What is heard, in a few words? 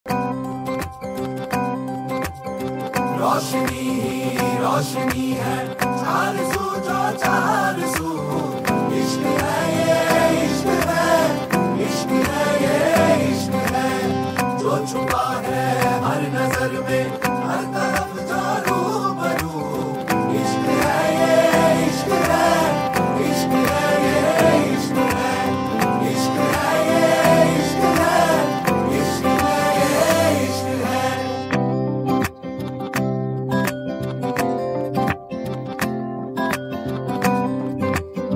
backing track